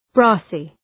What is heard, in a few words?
brassy